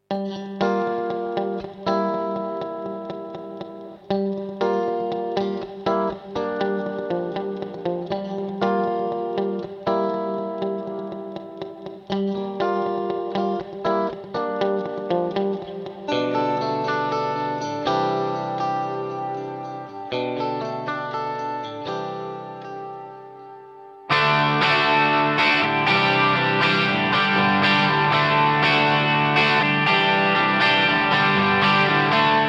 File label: Guitar Roland SRV 330 ST Lush Guitar; Vintage Digital